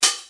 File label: Open HH, DL-909